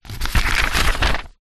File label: household colouring book page turn